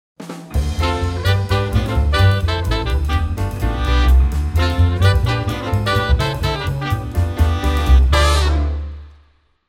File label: music jazz quintet